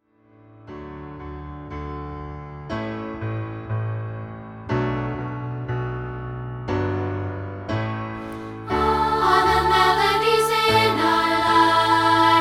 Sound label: We Believe In Music Vox PNO